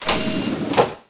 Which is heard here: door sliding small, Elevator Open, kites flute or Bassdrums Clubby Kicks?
door sliding small